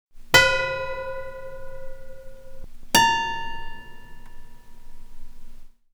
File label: Cembalo Strings Behind Bridge